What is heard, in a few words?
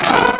gurp; QUAKE2